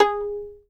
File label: fiddle pizz G#4 R; Sampleheads; Whole Lotta Country 1 & 2